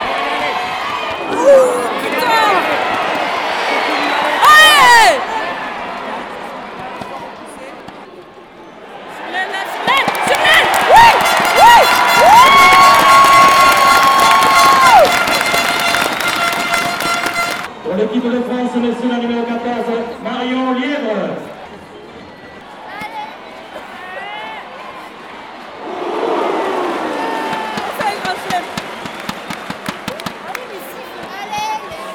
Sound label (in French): carte postale sonore rugby